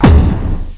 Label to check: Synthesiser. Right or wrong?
Wrong